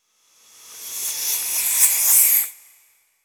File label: Shimmer, Hiss, Retracte, Weave; Electa Quinney